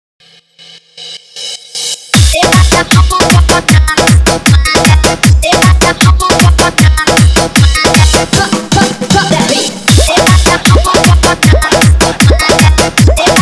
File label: Deshi Dance Remix